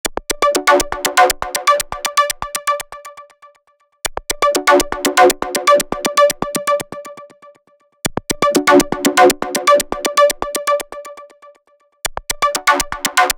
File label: rhythmic